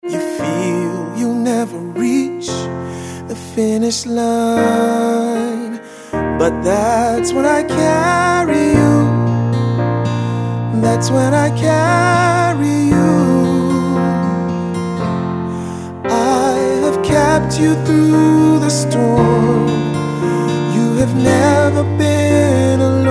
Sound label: ring tone